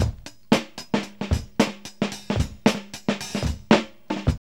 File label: busy funk 112; Zero-G; Funky Elements